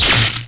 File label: saber hit